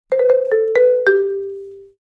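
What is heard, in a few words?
Marimba Nimble Accent; mallets marimba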